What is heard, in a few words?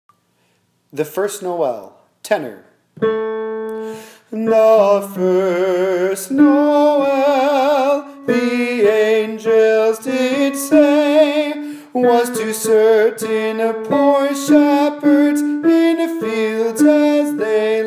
tenor